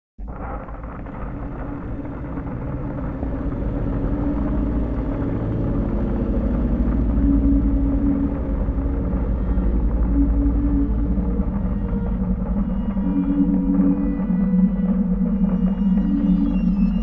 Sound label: Ui Title Ambient